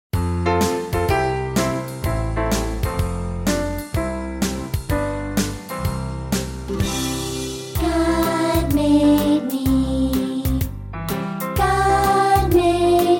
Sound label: Childrens Choir